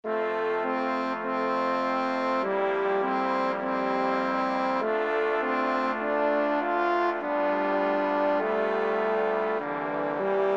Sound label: Brass Quintet